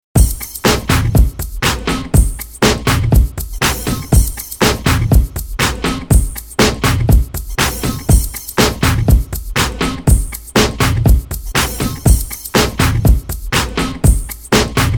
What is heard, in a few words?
beats bpm 121